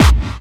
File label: KIT HOU; Best Service Dance Mega Drums